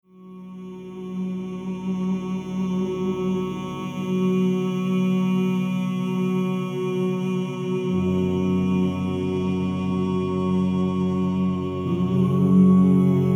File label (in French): extrait chant spirituel hu